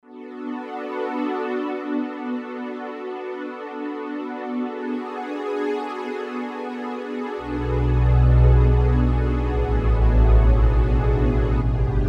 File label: Analog Pad, Roland JV-880; Factory Patches